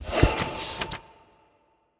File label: paytable Shown; goldengrimoire